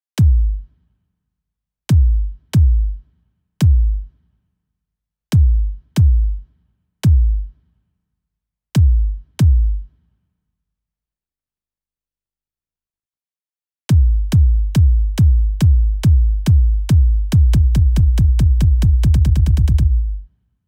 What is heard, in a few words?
140 BPM Break BD; Essential Clubsounds Vol.5; Catch a Plane Kit; Root A#min; Drums & Perc